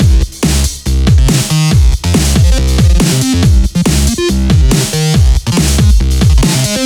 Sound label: music loop